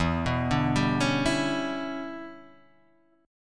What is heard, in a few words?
E Minor 13th guitar chord, Half Step Down